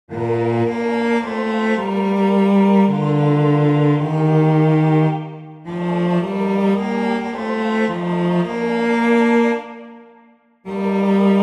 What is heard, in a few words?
bass; Behold How Good